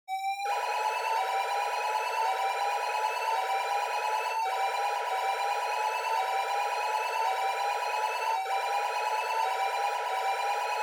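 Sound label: ambience, London Alarm Hallway; noise creations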